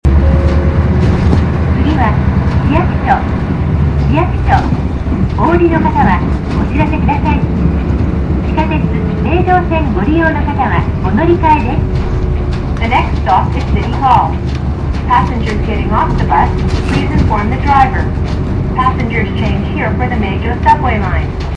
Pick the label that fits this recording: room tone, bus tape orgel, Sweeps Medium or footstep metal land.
bus tape orgel